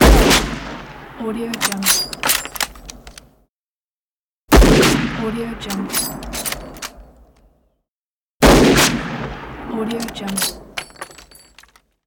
rifle gun shot and reload

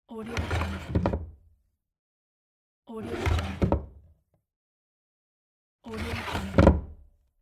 old wooden cabinet medium drawer close

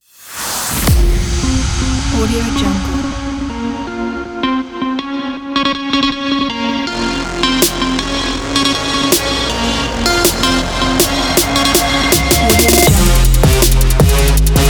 trap is the beat

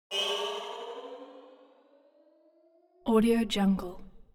sci-fi vehicle turbo activate modulated

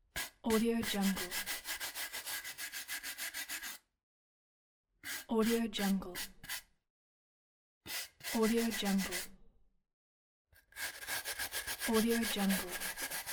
cleaning shoe with brush

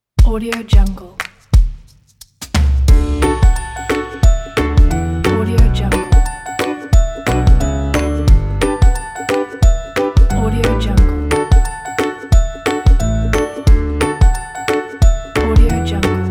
happy

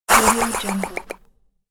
cartoon bird fast short fly away

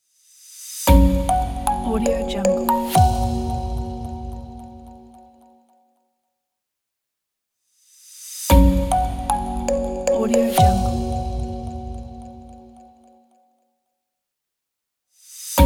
brand logo pack

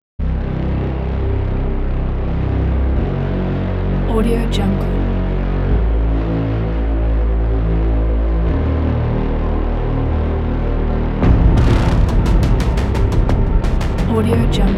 digital warzone

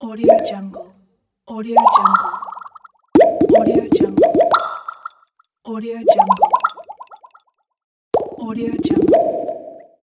plop

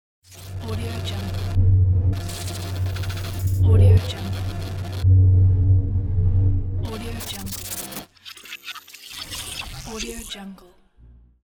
scifi interface sound

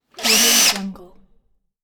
cordless drill short

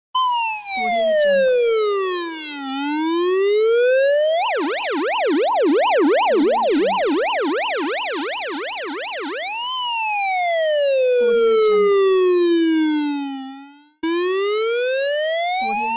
police siren

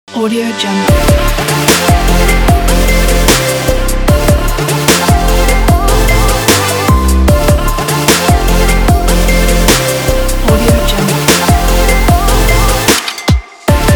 future bass

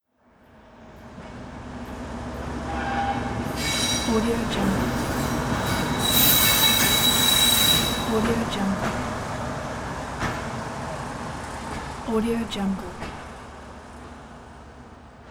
railway trolley; asepticsounds

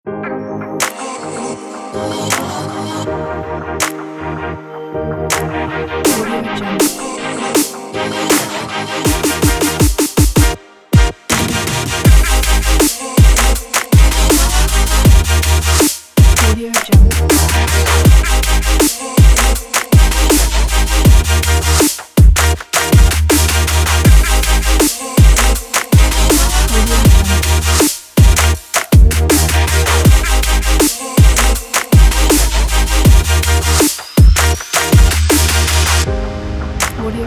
future bass on future bass

